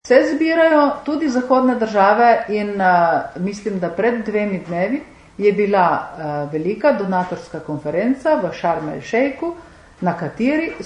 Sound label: jingle